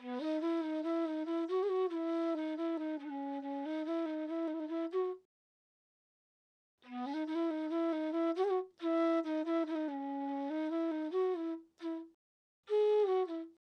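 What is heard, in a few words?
Hip Hop Flute E major 140 BPM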